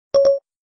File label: ios 5 siri tone